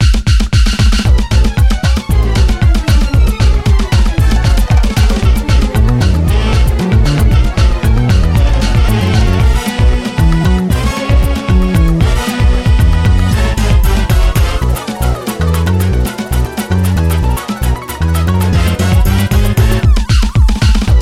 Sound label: Multi Player Panic; active life outdoor challenge